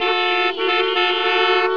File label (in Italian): traffico, veicoli; Effetti sonori